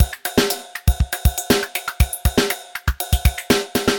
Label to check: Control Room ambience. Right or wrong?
Wrong